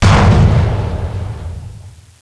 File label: Collision, Explosion; NanoFXv2; ExplosionFX; ExpCollision